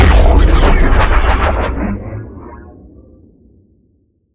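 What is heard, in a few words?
Sci weapon Shot Large Gun Gravity Turret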